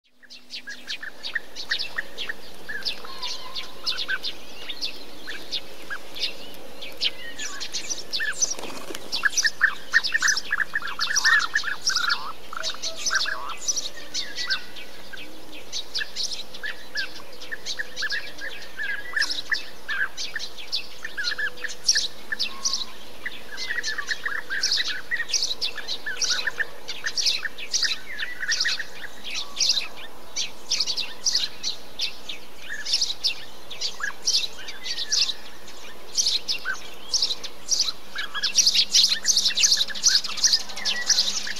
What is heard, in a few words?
Bee-eater, bird songs